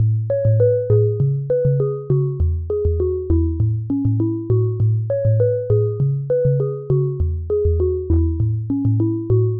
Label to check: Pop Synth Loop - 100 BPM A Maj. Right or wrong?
Right